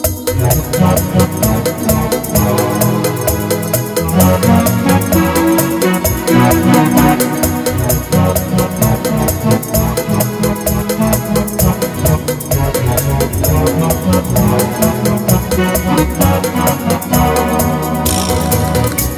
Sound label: Fast Banda Shuffle